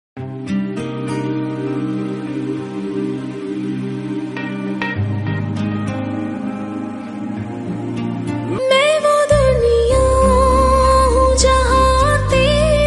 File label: Female Version